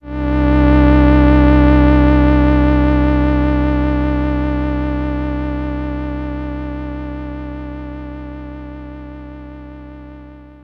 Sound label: SYNTH Romantic Moog Long